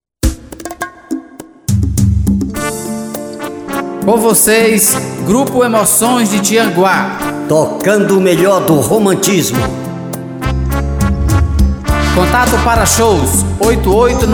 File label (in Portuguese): introducao